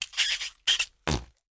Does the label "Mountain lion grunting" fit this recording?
No